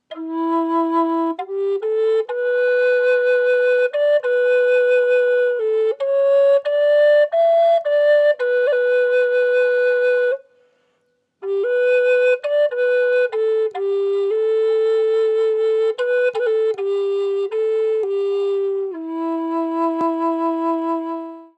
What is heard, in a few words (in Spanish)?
tono E, flauta medicina roble; varias tonalidades